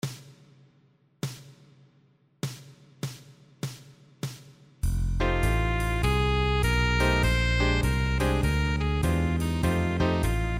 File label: play along guitar